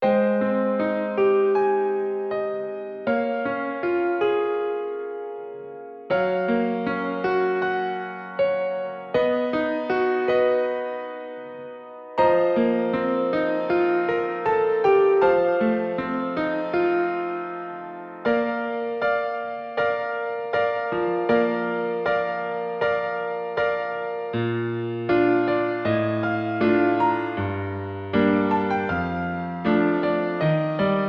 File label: Seriously warm piano solo